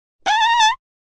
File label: Fart air noise